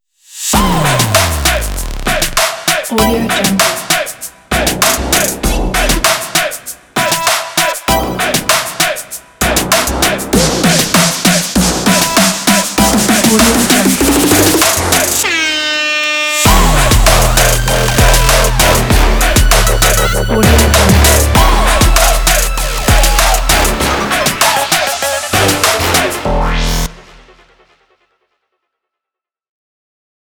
trap urban sport logo

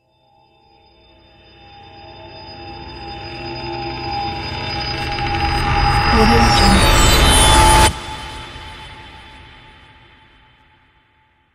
rise tension horror

